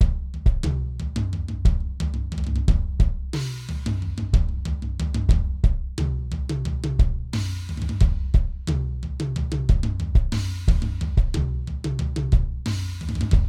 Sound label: acro pattern, 90